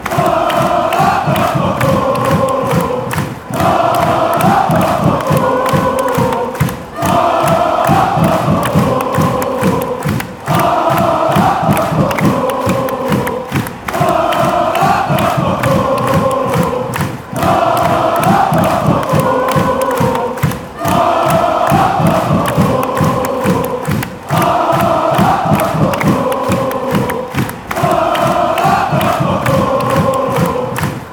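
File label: germany, football chants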